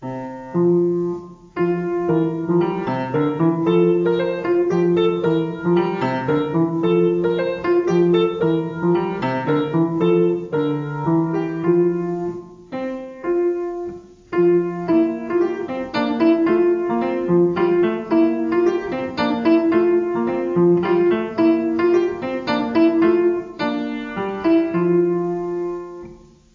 canon

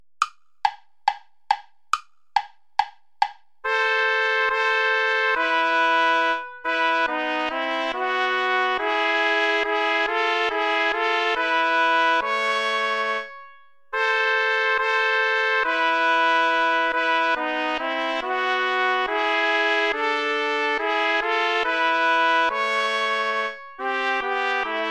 allemande play along without trumpet

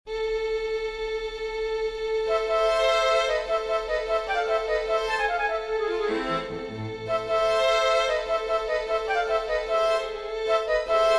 for flute strings; bizet georges; from carmen

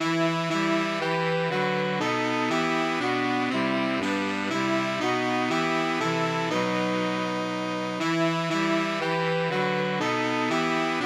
for saxophone quartet; god rest ye merry gentlemen

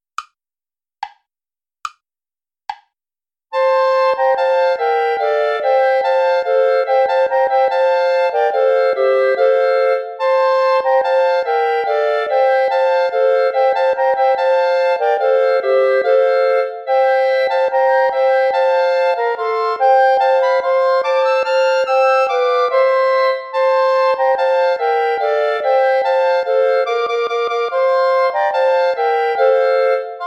play along without clarinet; deck the halls; traditional

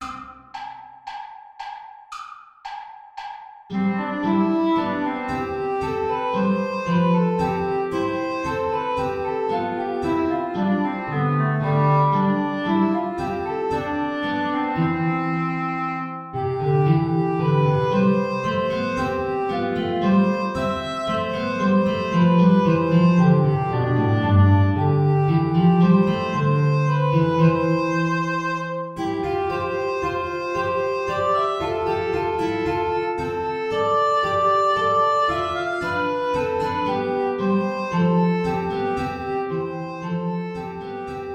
air play along without contrabass; bach johann sebastian

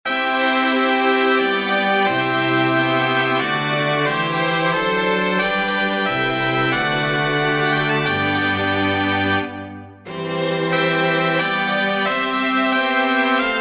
chorale for brass strings; bach johann sebastian; laa dein engel mit mir fahren

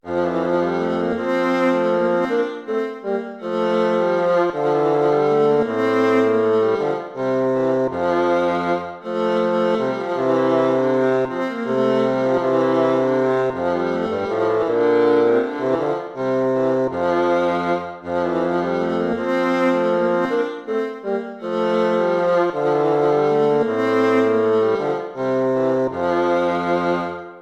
lute

